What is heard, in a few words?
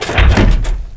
SUSPEN; mtm2demo; PC Gamer 4.2